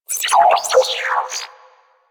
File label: Ship Computer Terminal Chatter; Ambience; Props; Warframe; Corpus Ship Remaster